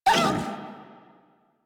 Kubrow Feral Vocal Pain; Warframe

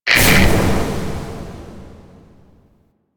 Order Mode Toggle On; Warframe; Veilbreaker; Devourer Kahl

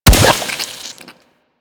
Chem Strike Nox Rifle Projectile Explode

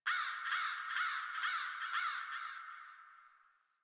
Crow; Warframe; Clan Dojo; Dojo Misc; Dagath

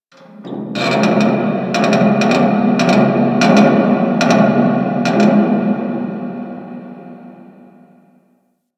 Distant Metal Creak; Ambience; Warframe; Corpus Infested

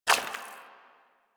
Pirate Footstep Default; Warframe; Warframes

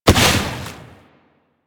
Bubblegun Pistol Hit Explosion; Warframe; Weapons; Tenno

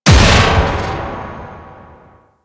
Riot Moa Shield Smash; Warframe; Attacks

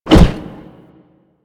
Shield Swing; Warframe; Grineer

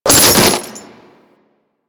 Condemn Chains Beam; Warframe; Warframes; Priest

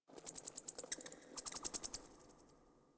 Insect Night

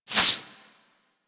Arrow Whizzby; Warframe; Weapons

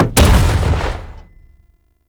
Siege Engine Cannon Fire

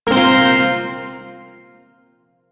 Shawzin Hirajoshi Chord; Warframe; Duviri Shawzin

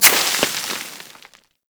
Melee Impact Dirt; Warframe; Weapons

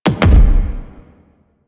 Weapon Punch Double

